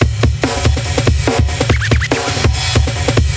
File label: drums, 142 Dmin, Hardcore Breakbeat; Big Fish Audio; Hardcore Breakbeat Action; Shake That Booty